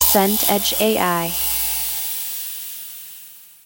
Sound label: downlifter long